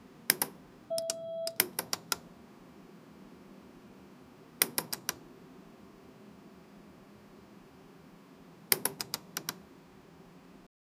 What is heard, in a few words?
repeated pressing of elevator buttons; man made